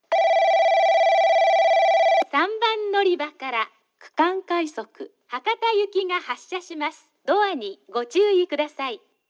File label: omuta regional rapid hakata, portable recording